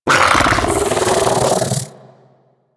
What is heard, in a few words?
bug vocal mount; Warframe; Hunting Critters; Inf K Drive Bug